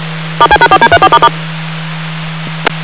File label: dtmf page normal